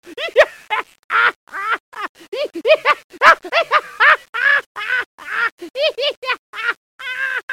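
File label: Fun Laugh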